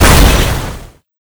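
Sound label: boss explosion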